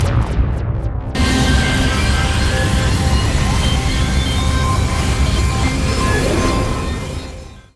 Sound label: Cell Clear; Nano Assault Neo-X